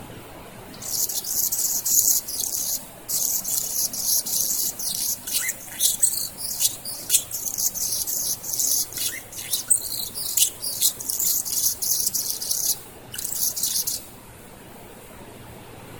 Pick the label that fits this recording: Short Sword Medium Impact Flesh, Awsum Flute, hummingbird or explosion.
hummingbird